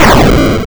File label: Explosion; Space Shooter